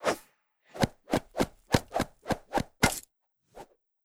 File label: baseball bat start